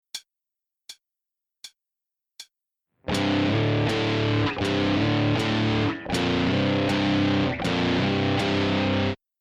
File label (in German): Powerchord, E-Gitarre